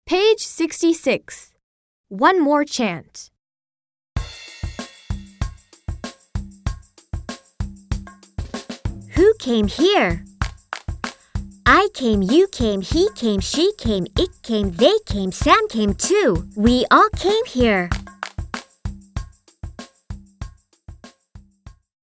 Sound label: One more chant